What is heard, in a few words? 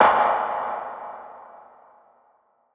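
Snare Fx 140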